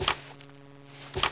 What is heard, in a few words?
open, copier